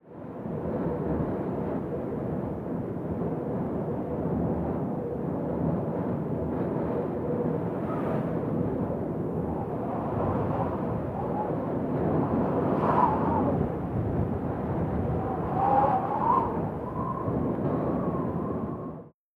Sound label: Wind